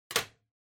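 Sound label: Soroban Put, abacus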